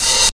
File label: smooth open hi hat sample C key